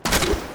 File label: Bow; nox